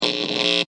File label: Electric Zap, Short circuit electric buzzing; LYN111 package of Electricity sounds; Electrical Sound Effects; LynneMusic